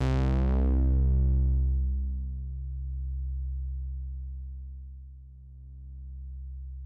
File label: SYNTH (C), Melodic One Shots; Mega